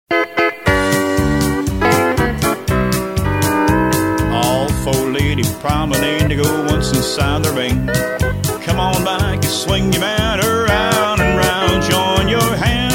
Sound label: vocal